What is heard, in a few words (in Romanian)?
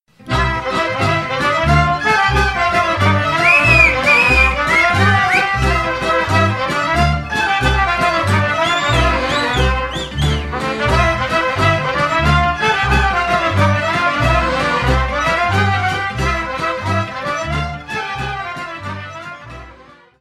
Suita de Dansuri din Moldova, Roumanie